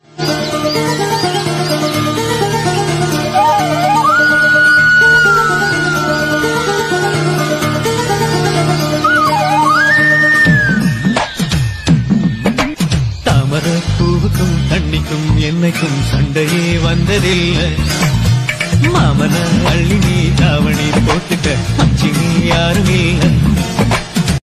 Bgm Ringtone; Leo Movie

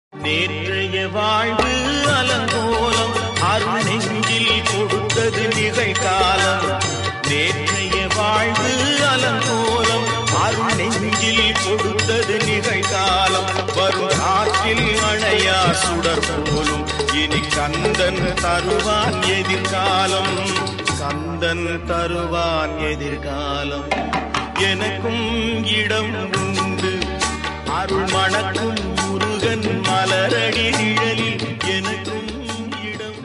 Songs Tamil Murugan South Indian

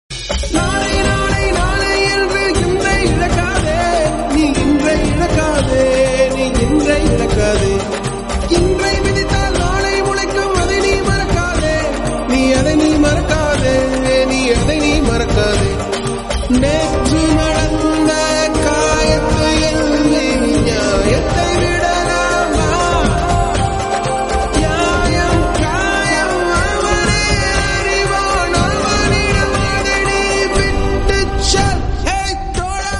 Tamil Song

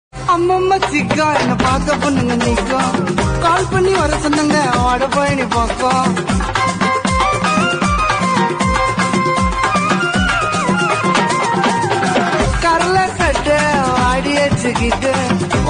Chennai Gana Song Tamil

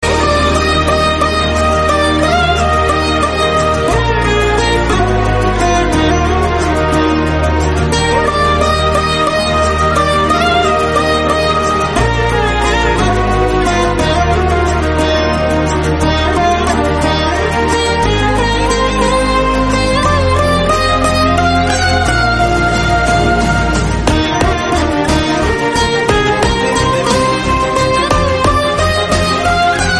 Bgm Love Feeling Tamil; Viswasam

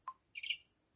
Birds Chirp; Birds Chirp Ringtone

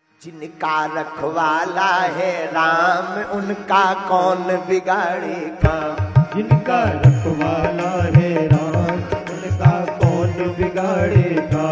Bhajan; Bageshwar Dham Sarkar

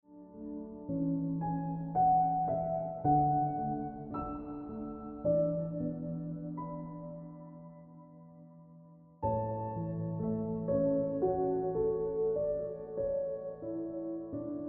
Ringtone Piano Music

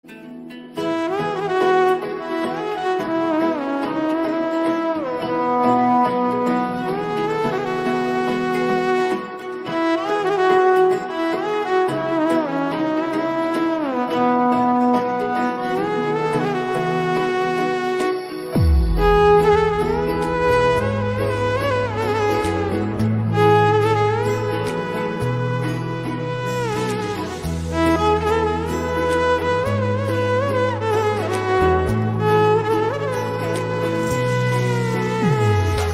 Instrumental; Ringtone

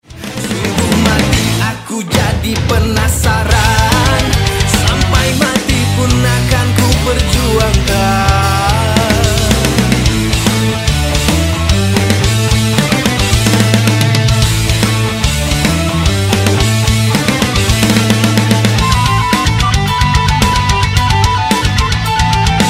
Rock Versi Ringtone

